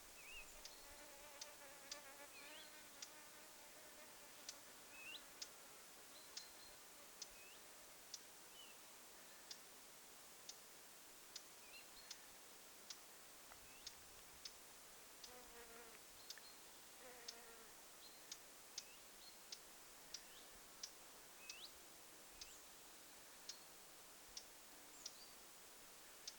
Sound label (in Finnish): ilm hernekerttu nuori pajulintu